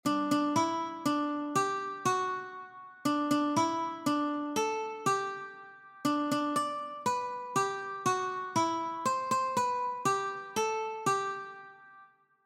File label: Happy Birthday Ukulele